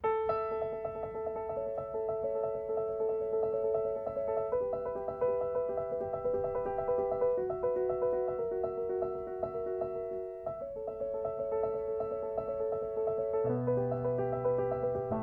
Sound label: Nice Piano Interlude